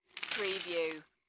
Nuts Set Down On Paper; sound-effects-bible

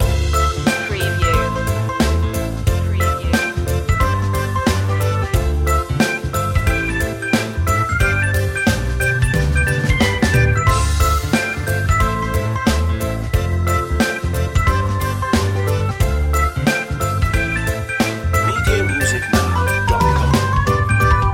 walk happy loop

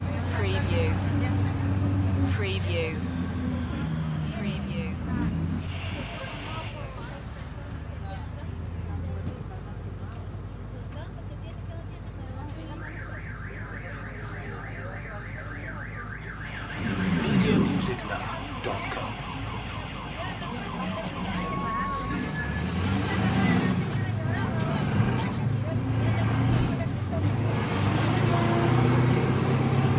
bus ride el salvador

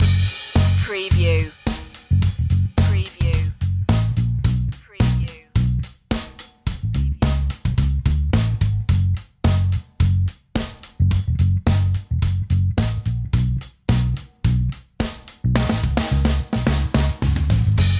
disco funk drum bass only

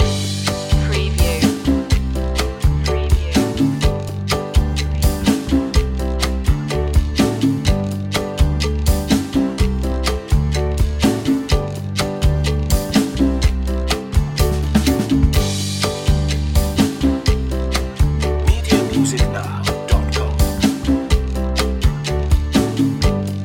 latin fiesta on the wall loop

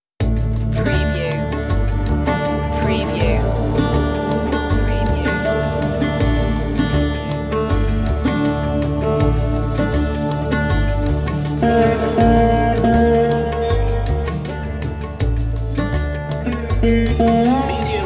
sarod and flute raag bhairav

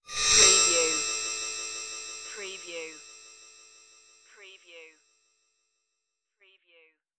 shiny magic transformation

